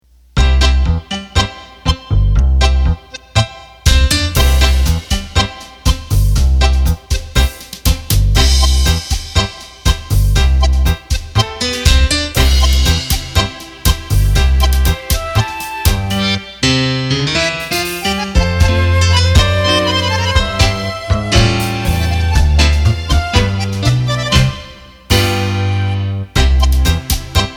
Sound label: Tango; roland bk7m